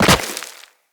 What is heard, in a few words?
Operator Land Soft Rock, Footsteps; Characters